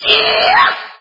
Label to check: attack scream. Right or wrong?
Right